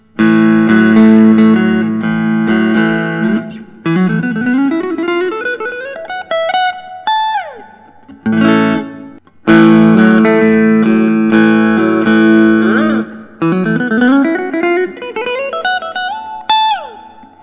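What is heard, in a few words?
warrior bella 52 ice tea burst, guitars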